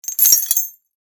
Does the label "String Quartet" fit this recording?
No